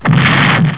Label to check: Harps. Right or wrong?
Wrong